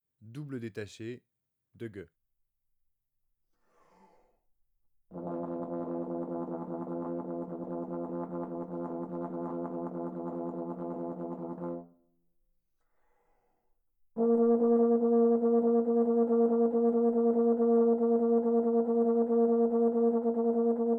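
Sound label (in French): double détaché, trombone; Textures; Sons granules sonores; traite orchestration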